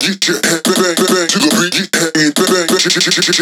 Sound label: Vocal Loop 140; Vocals; Advent Calendar 2019 Day 23 - Vocal Loops